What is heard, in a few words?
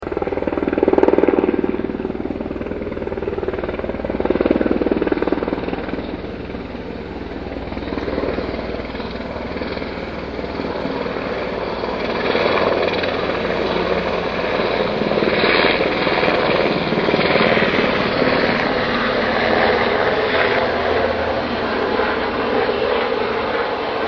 air noise